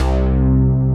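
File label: SYNBASS, synth bass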